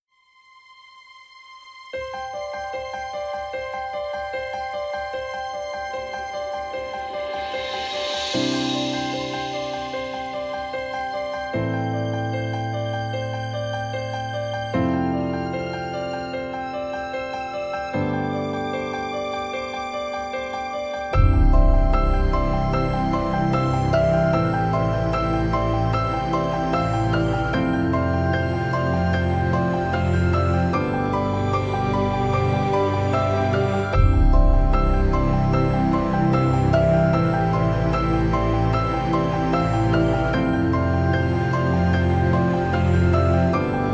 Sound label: Inspirational Piano